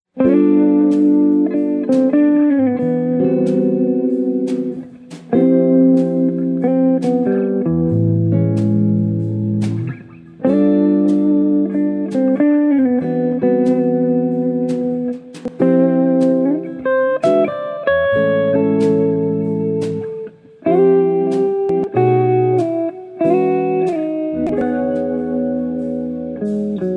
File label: tugboats